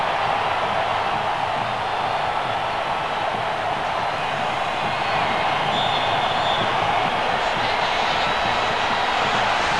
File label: song; basketballworld